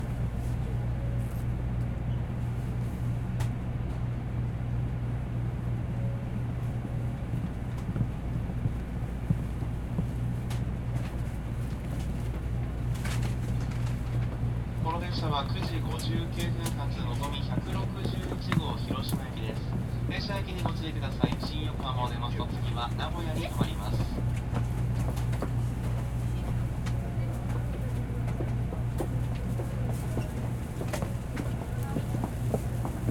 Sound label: BULLET Onboard Passenger Cabin Boarding, Japan Train; Prismo Sound; Japan Train and Platform